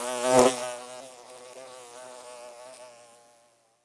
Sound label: amb fly; netent